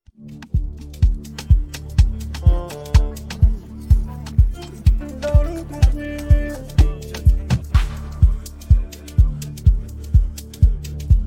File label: Instrumental; Emilokan